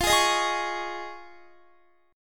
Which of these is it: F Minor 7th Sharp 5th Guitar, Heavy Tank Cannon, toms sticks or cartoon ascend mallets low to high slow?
F Minor 7th Sharp 5th Guitar